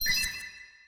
gem Win; netent

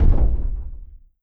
win Refill Heavy; netent

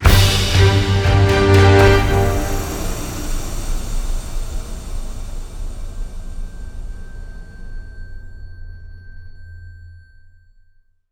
music freespin outro; netent

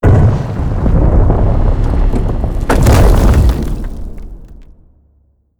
bonus Bomb; netent

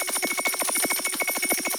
Countup

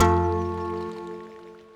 Win General; netent

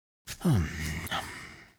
red panda Scratch; netent

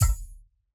reel bounce; netent

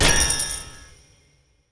multiplier land; netent

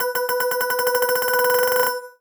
Count Crescendo; netent

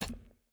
spin Button Down; netent

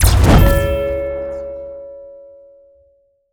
bonus Scatter; netent

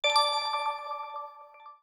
countup end; netent

